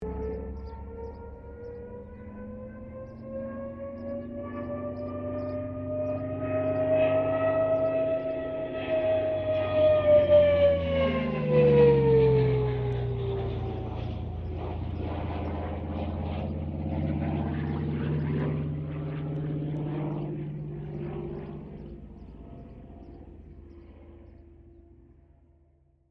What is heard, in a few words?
AIRCRAFT CA-13 BOOMERANG PASS STEREO